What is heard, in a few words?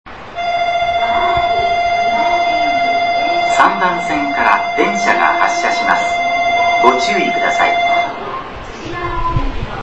bell; jingumae